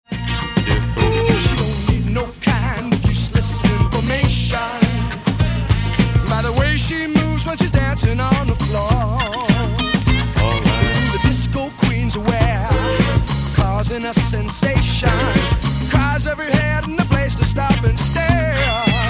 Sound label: disco